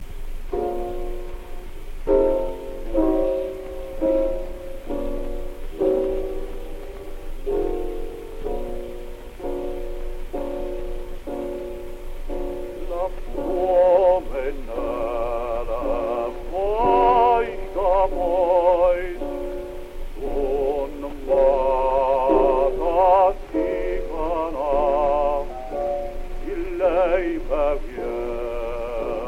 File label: Gramophone